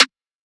snare filler; one shots; SEISMIC Drum Sample Pack; Slate

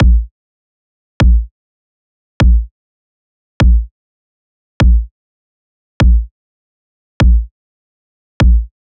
kick loop 100; Pop; Drums; Loops; Slate; SD PASADENA Pop Sample Pack

